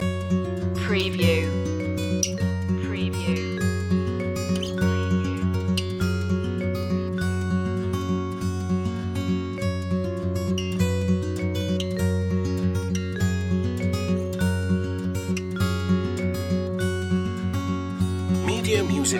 get up and go loop, acoustic, country folk

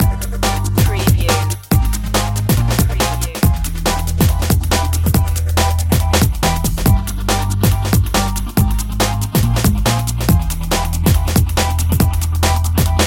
fast spinning loop; assertive

